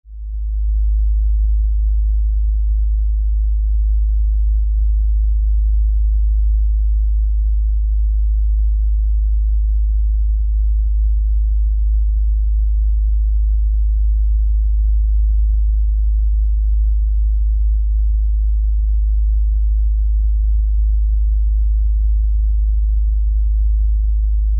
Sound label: LFE Rumble, Lfe Rumble Low Bass; Blastwave; Sound Design